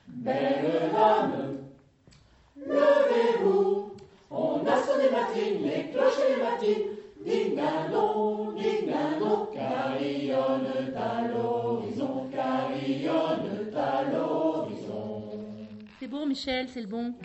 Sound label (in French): Alti et basses; Le Sire de Vergy; Belle Dame